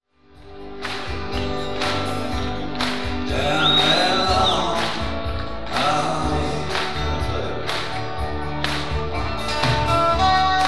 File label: solo